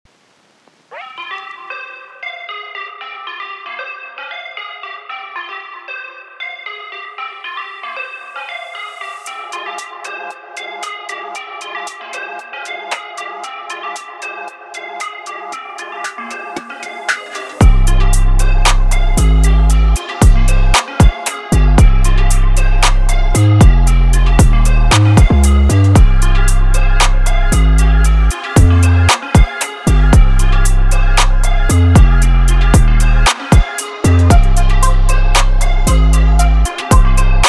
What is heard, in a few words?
trap; traktrain; trap time vol. 2